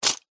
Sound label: household medicine tablet blister foil pack set down surface